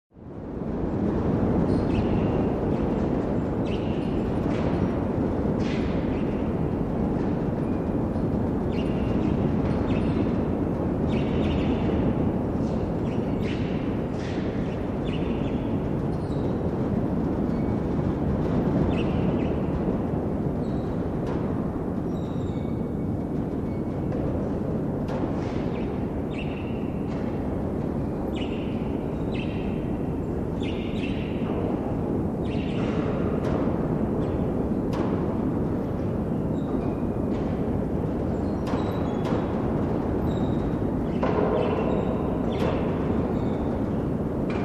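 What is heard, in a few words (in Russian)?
звук ветра в ангаре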